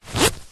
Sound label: foley clothing body warmer nylon zipper short zip